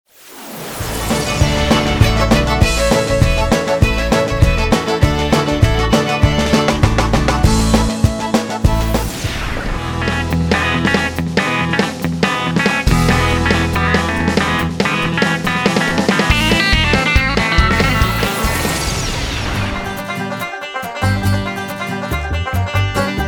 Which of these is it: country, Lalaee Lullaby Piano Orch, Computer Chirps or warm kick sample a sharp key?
country